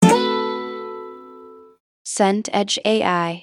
guitar harmonic

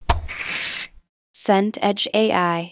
chalk board

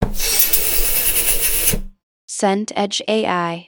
boiler ignite

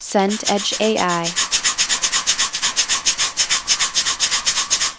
washing up water

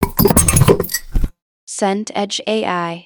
pour coffee cafetiere

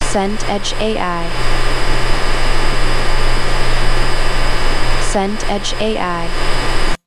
bed server room

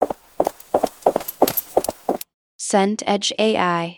horse hooves walk

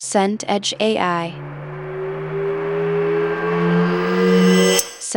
riser tonal mid

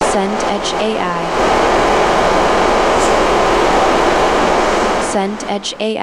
crowd murmur

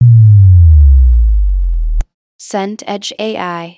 sub sweep down

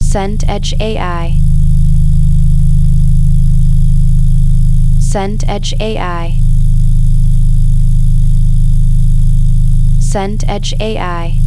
room tone basement

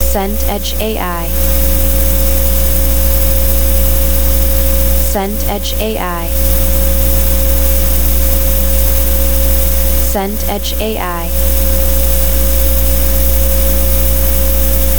room tone studio

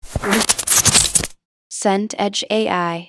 clothing movement

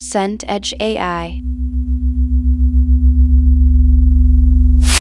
energy charge

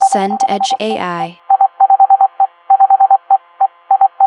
phone busy tone

